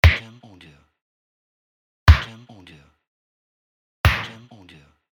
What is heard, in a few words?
Punches